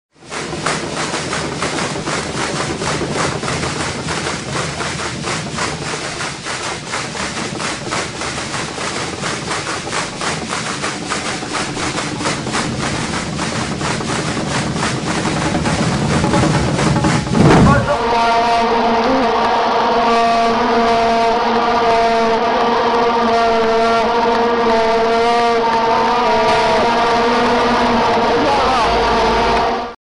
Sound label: Caxirola Sound; FIFA 2014 World Cup